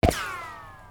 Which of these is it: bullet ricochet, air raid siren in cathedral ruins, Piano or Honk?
bullet ricochet